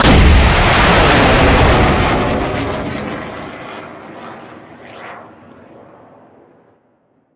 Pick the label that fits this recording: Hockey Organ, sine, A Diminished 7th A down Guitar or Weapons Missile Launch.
Weapons Missile Launch